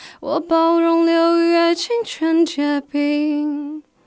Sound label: Alto